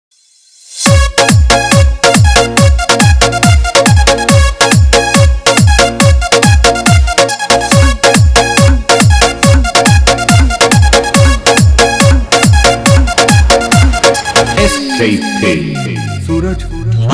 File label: Bhojpuri Remix Song